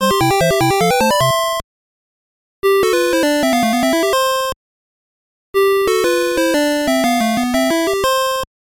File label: sound effects; lode runner